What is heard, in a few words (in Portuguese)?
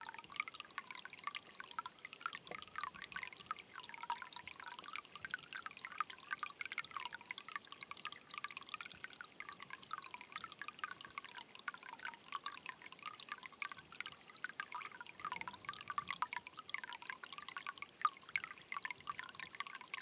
Nascente de agua bem suave na Chapada dos Veadeiros